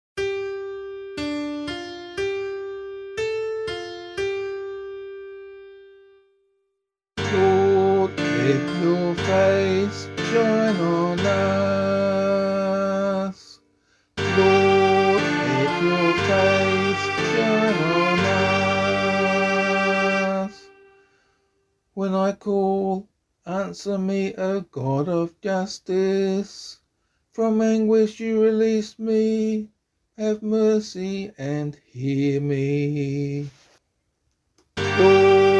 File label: Psalm, vocal